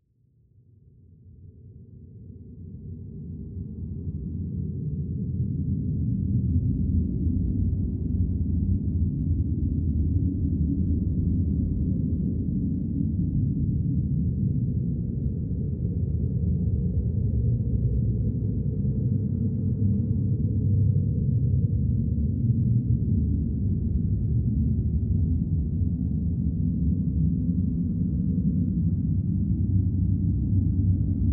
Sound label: Horror Drone Dark Ethereal; Scary Tones; Sound Effects:Horror